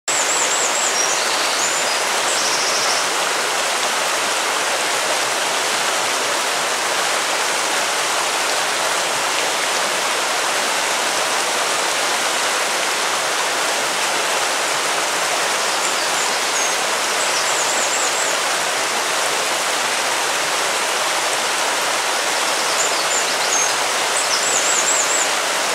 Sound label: Water Flowing River Sound